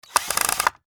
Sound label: Industry Electric Drill Tighten Screw In Wood